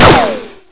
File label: cement, debris